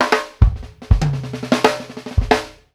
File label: TOM R, Drumworks FILLS 96; Sampleheads; New York City Drumworks VOL-1